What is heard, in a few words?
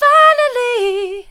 finally soft, vocal